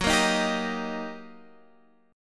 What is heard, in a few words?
Eb Major Gb; strummed; Eb/Gb